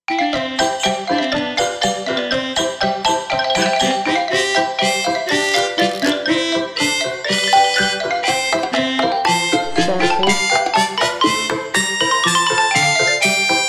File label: Toy Polka